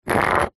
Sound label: foley stone scrape scratch mark concrete; zapsplat